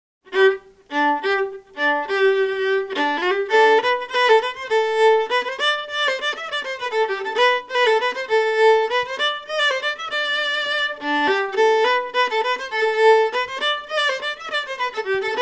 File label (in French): Bourree